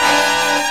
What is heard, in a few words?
hit, brass